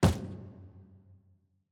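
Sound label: ambience room polygon punchbag training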